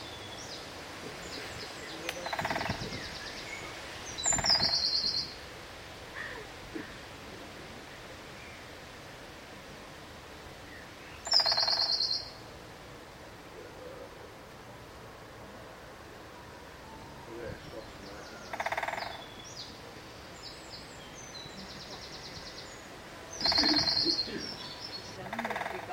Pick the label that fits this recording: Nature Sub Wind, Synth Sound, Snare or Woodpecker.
Woodpecker